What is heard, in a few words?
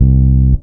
Bass one shot C, Basses; Electronic Point Blank